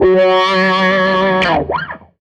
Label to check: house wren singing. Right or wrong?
Wrong